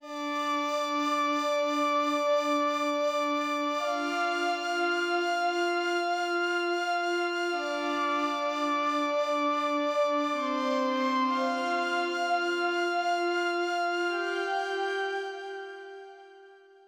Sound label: Pads; super crush k.o.